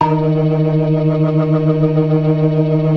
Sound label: ORGAN, 4 DRAWBARS; Keith Emerson Signature Series